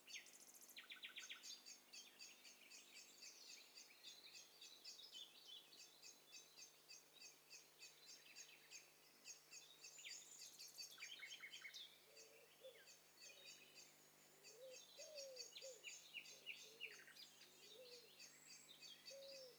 STEREO BIRD COCKTAIL PARTY

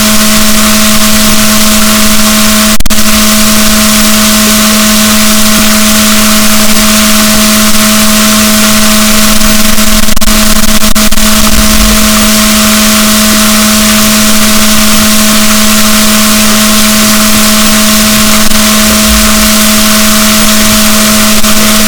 rorquals negatif, boat

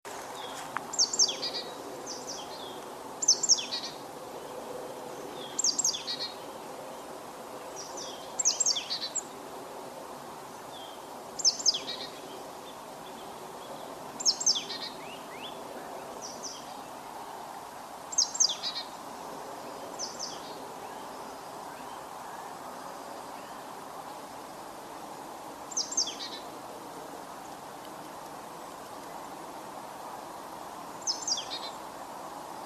Poecile palustris; SETE

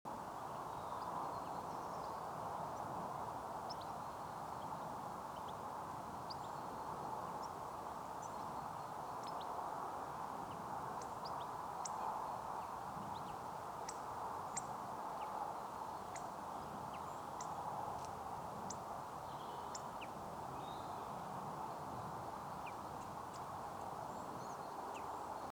Carduelis carduelis; SETE

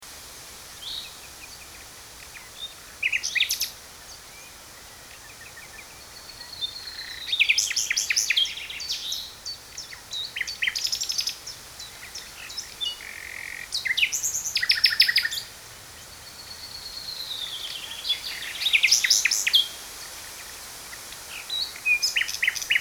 Luscinia megarhynchos; SETE

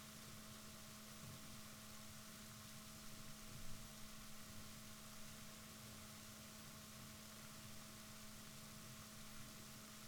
rorquals negatif, Balaenoptera Physalus Chile

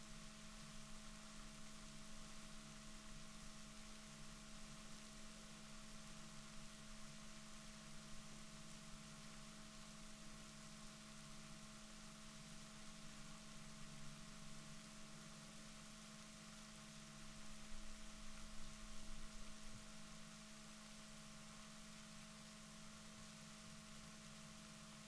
rorquals positif, Balaenoptera Physalus Chile

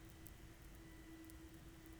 pulses, BALAENOPTERA; MONACO 2022-07

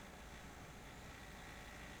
pulses, BALAENOPTERA; MONACO 2022-07

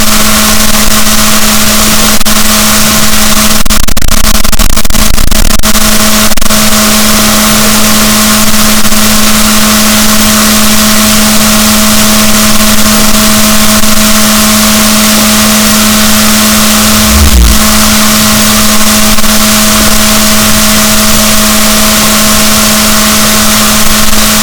rorquals negatif, boat

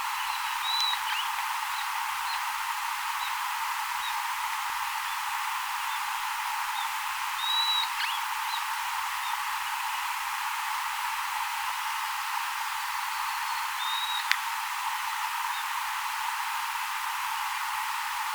bird

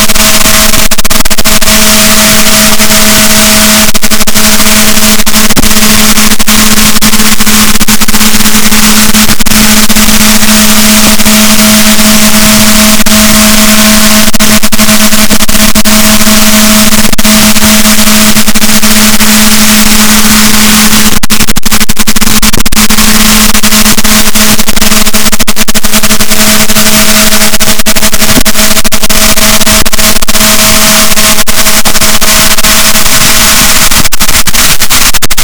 rorquals, Balaenoptera Physalus, boat

